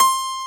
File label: micro, pop pianos; Roland L-CD701